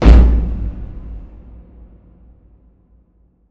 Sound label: impact large hallow; Hits, Impacts